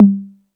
Beat Box; E-MU Pro-Cussion

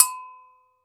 GANKOKWE, African Perc

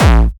Jump kick, Jump hardstyle kick

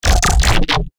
glitch stab; Virtual Riot; Glitch Stabs

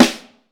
Vintage Snare Drum; 4X14; Q Up Arts; Johnny.C's Vintage Snare Drum

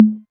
808 Tom Hi, Novation Drumstation; Novation DrumstationV1

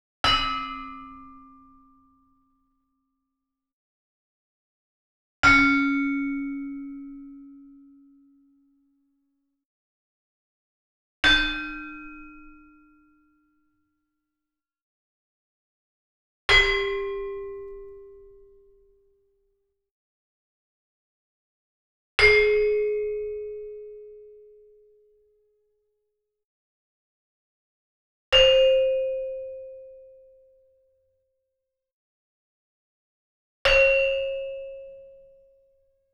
Gamelan; Propeller Island - Complete Gamelan

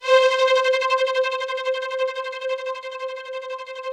ROUGH STRNGS, Yamaha VP-1; Music Store

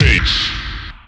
BASS VOX R, Big Beat Samples; AKAI; SPEAKS KIT

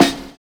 ETHNO 70; Univers Sons

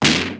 prc C#2, Perc Set, CASIO FZ-1; Retro Sampler; Crazzy Perc Set

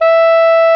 SOP SAX E5; Greytsounds VOL-1

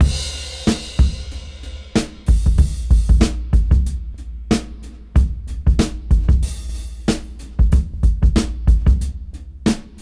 Rap 93 BPM; AMG - Keith LeBlanc Phat Butt Jams

